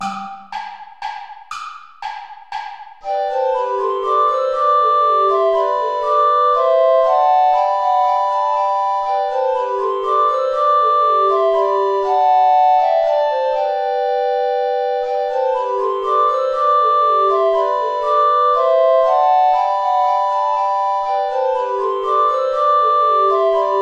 saltarello play along without bass recorder; praetorius michael

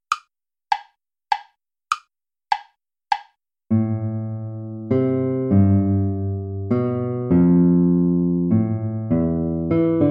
minuet play along; bach johann sebastian

